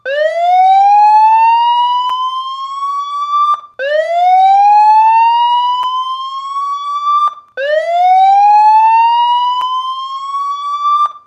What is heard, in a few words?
whoop; Tomar Electronics